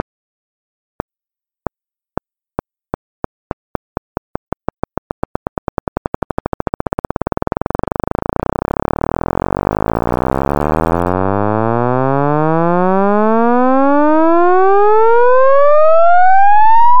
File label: clicks